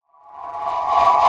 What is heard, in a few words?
Hissing Approach